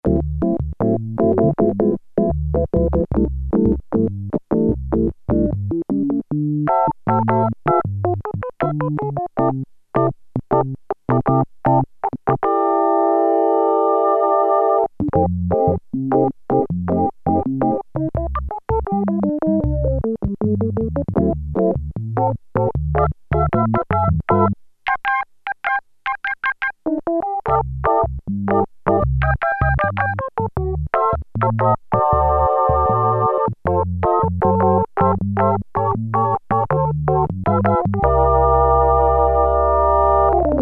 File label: Organ With Resonance, Prophet-5; Sequential Circuits Prophet-5 (Ver.3); Factory Patch